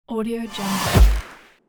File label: transition sudden frantic sweep impact; transitions and movement